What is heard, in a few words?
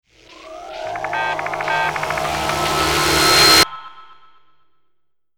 Sci Fi Teleport; SoundsCrate